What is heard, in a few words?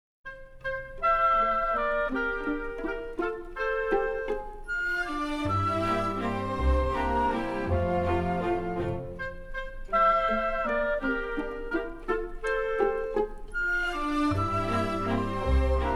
Symphonic Orchestra